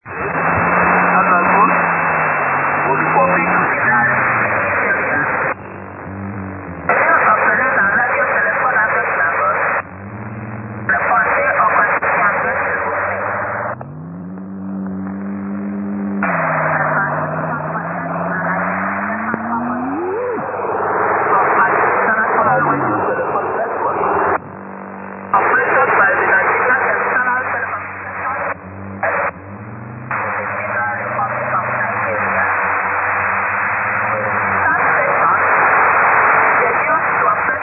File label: usb